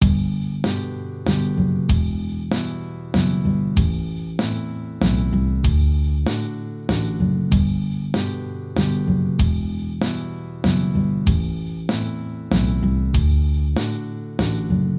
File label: Waltz